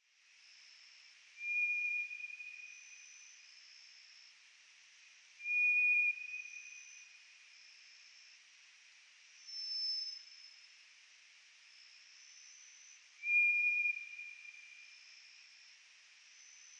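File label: White's thrush; toratugumi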